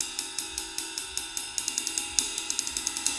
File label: Ride Cymbal; Electronic Point Blank